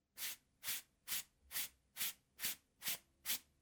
zvuk shhetki

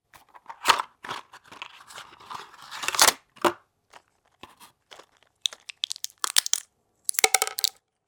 zvuk tabletok

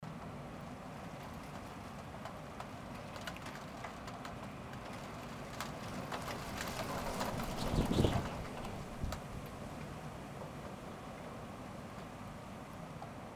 zvuk dozhdja

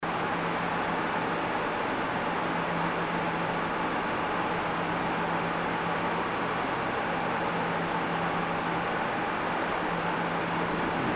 zvuk kondicionera

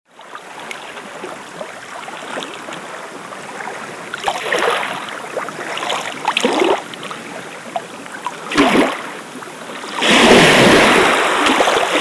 shum morja

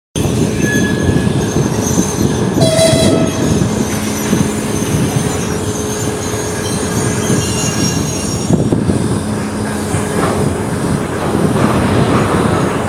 zvuk poezda

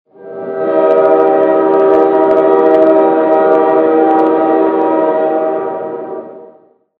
zvuk gudka poezda

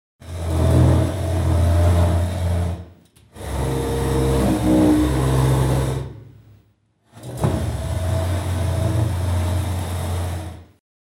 zvuk taburetki

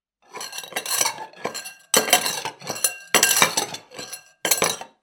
zvuk tarelok

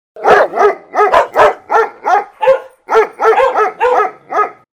laj sobaki

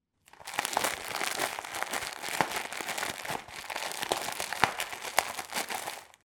zvuk paketa

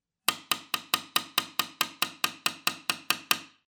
stuk v okno zvuk

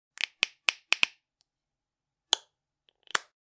kryshka tjubika

